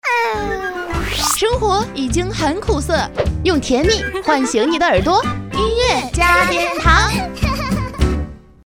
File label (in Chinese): 音乐 片头; 音乐加点糖片头终极版